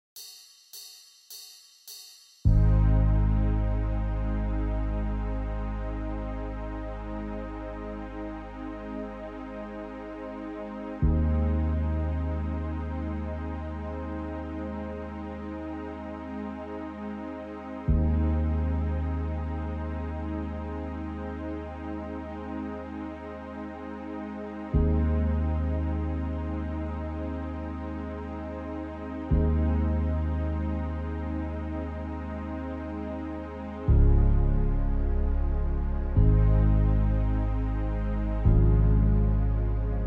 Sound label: Karaoke, 105 BPM; BasserMusic Studios